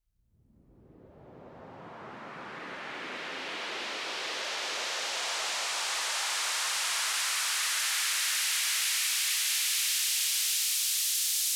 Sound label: Uplifter; 122bpm A#min; Construction Kit; Ghosthack; Summer Freebie; Acapella Kit